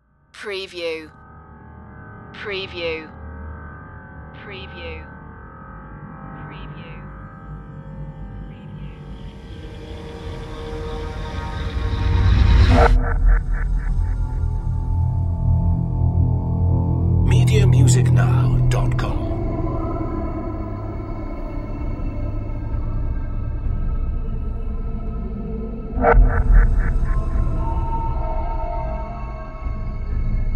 dark ambience

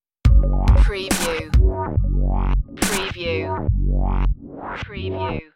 inevitable drum stinger

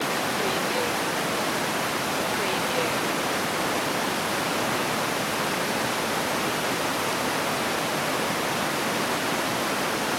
river noise

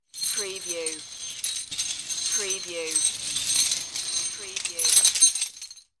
Chains Rattling And Clanking Together

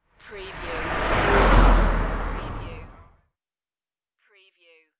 Car fast drive by; beanstalk audio

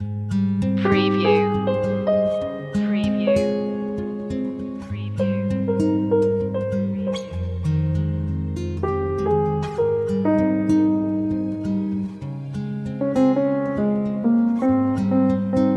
lush dinner loop

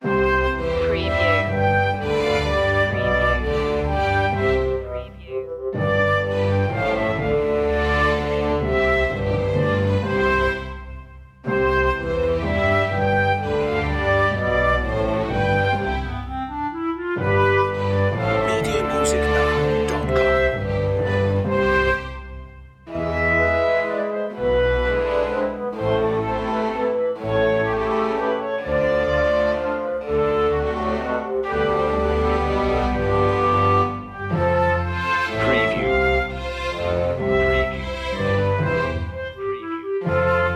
classical breezy theme

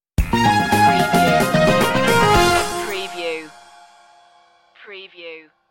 groove stinger

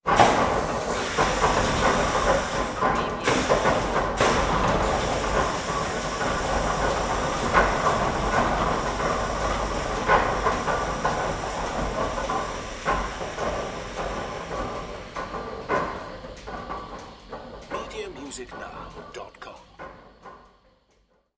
Press Type

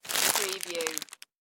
Pasta Bag Pick Up

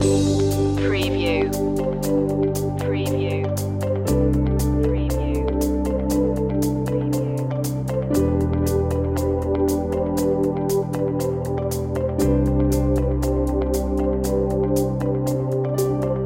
corporate skies loop

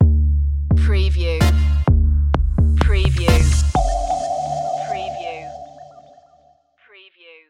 dub tek 808 stinger

